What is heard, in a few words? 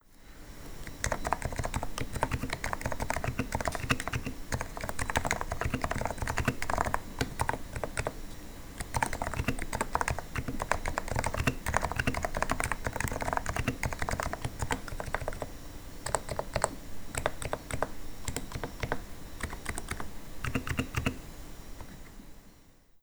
Novatouch fully silenced, keyboards